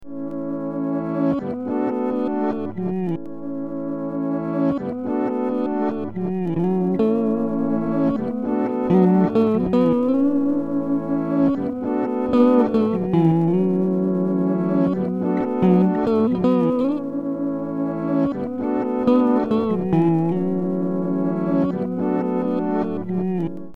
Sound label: loop station